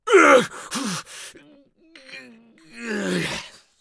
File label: death; Escape from Tarkov